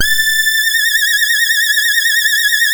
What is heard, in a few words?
Synth and Organ; InVision Interactive; Keith Emerson; The Most Dangerous Synth and Organ